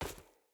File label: Battle Dog Walk Veg, Footsteps; Snc Battle Dog; Foley